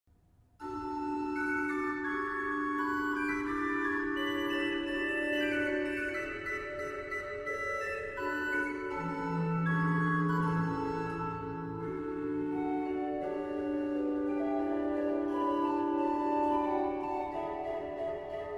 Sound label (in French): Allegretto en ré majeur K 397, orgue